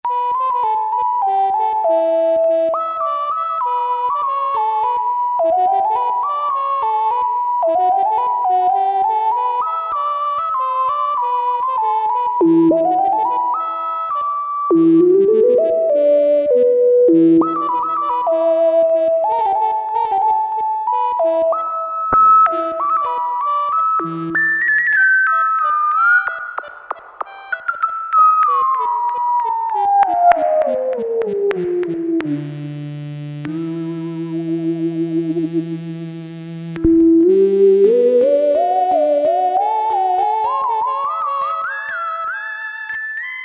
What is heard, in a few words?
Poli Viola + Mono Piccolo, Farfisa Syntorchestra